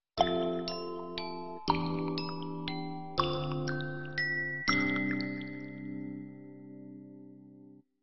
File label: bootup; ZTE Nubia-Z5S-Mini